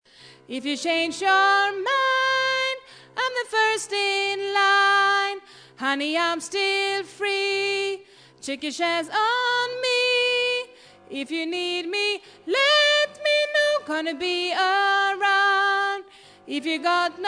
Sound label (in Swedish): sopran, stammor